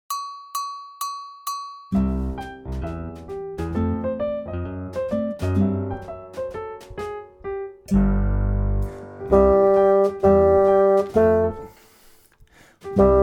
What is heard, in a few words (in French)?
basson